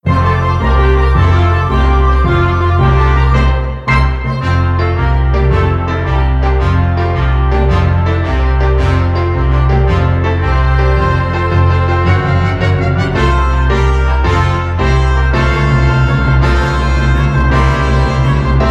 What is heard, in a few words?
ACC